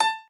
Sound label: piano